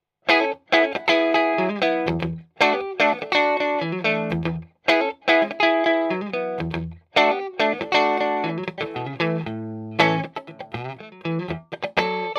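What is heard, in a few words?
strato clean; reverb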